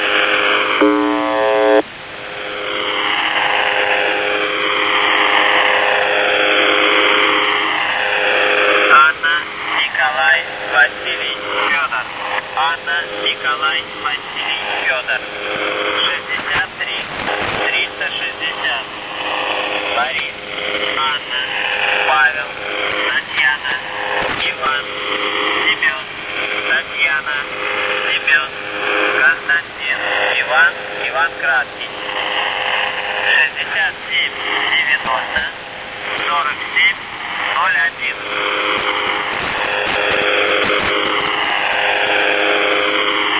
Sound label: buzzer usb msg